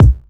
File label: low tone kickdrum sound c key; tuned drums